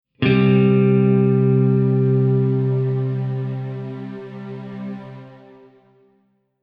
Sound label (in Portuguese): Nota Do e Sol, guitar